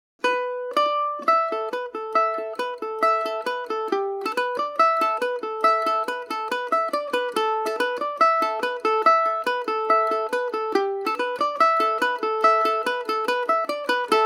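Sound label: polka A Dorian, Irish mandolin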